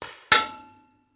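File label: Paintball Gun Impact; Sound Ideas; Guns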